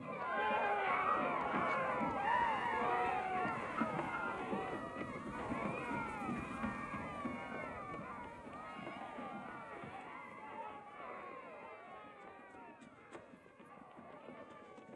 Harbor Village Market

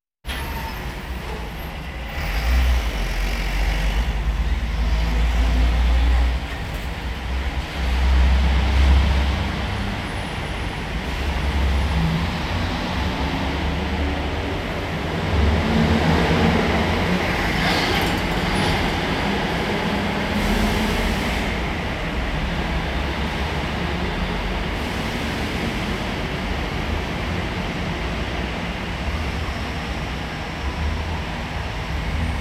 Walking Machinery Trucks

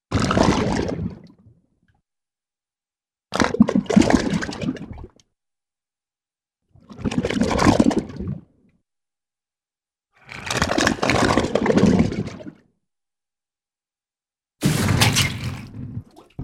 Water Bubbles - Draining - Gurgling, Cartoon; Hollywood Edge; Cartoon Trax

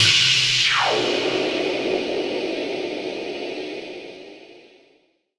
NOIS; XXL 800 Production Effects

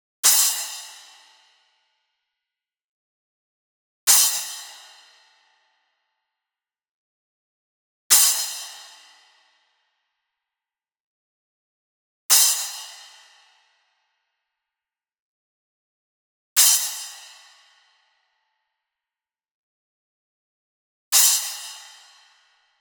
CYMBAL Splash Hit Hard Beater Near; boom library; Cinematic Strikes Construction Kit

